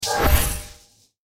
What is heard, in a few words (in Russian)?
zvuki volshebnoi fei